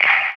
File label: DR. LOOP, DRUMS; X-Static Goldmine 3